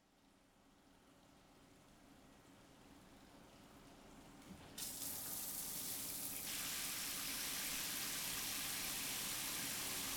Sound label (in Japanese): シャワーとヘチマでボディウォッシング